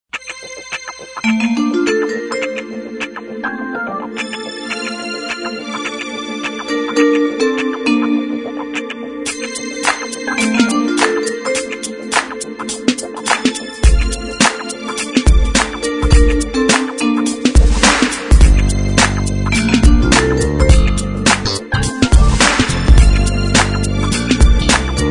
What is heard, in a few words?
Jazz Train